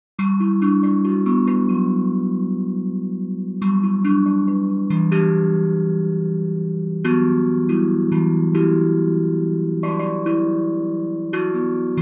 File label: live improv; audio brewers